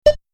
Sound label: boop